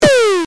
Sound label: Enemy Death